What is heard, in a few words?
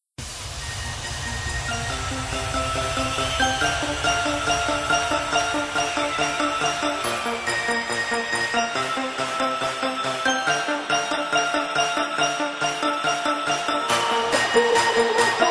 music, jailbreak; niftymaps